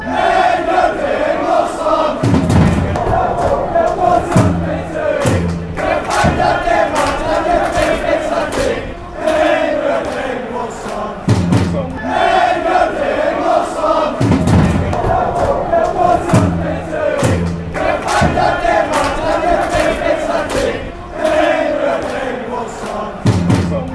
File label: fan chants; dingle slums